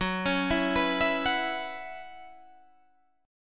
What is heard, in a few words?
B Suspended 2nd Suspended 4th